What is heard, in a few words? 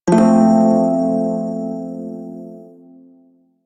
Prime Shawzin Maj Chord G; Music; Warframe